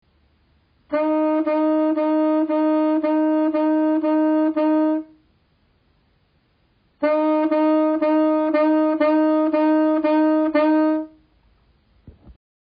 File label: Tonguing